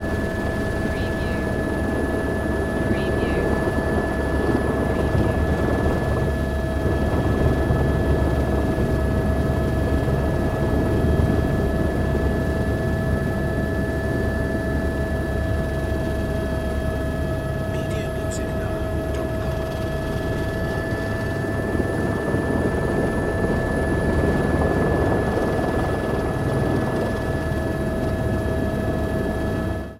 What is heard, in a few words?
Helicopter Flying Turns; chopper